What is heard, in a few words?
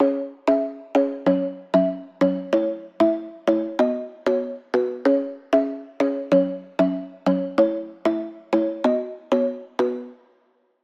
Pluck; Routed Sound; Spire Expansion - Story Walker Vol.1